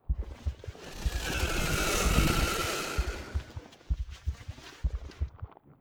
space dragon idle; Stellaris; guardians

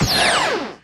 laser turrent fire, weapons; Stellaris

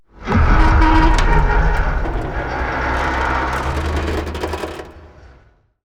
archeology event metal fatigue; ancient relics

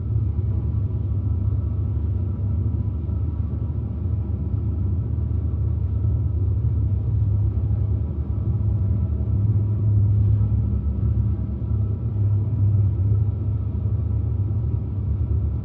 ambient colony ship hum; Stellaris

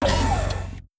click spaceport module; Stellaris

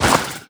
reptilian pops move; Stellaris

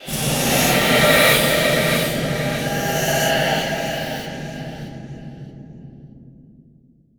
hivemind show planet

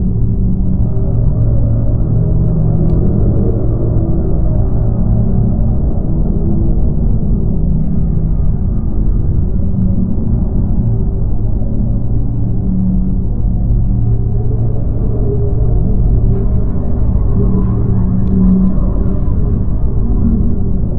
avian colossus idle; apocalypse

